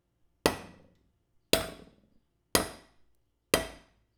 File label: Pick Hitting Rock